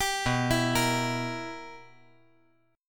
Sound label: B Major 7th Suspended 4th Sharp 5th, chord